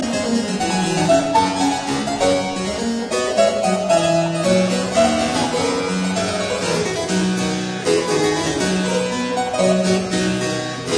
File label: Suite in C major Jig; Locke; Melothesia; Arthur Haas